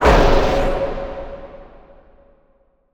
big one shot

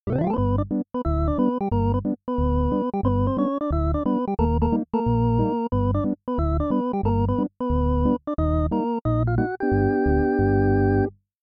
elec org

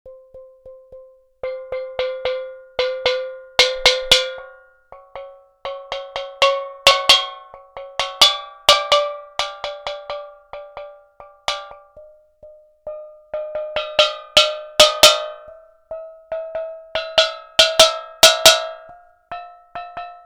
steeldrum

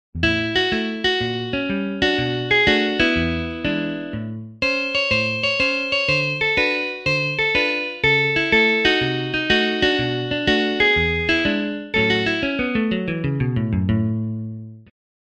overdrive dry

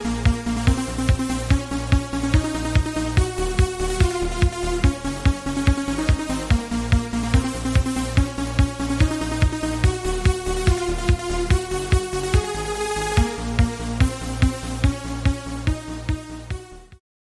lead hypnotic trance

